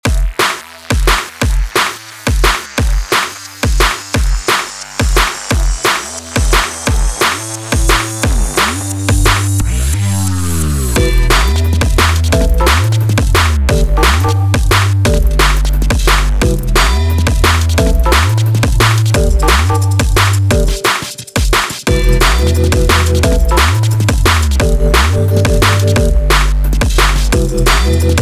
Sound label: simple drum and bass